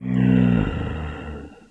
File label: neutral, zombie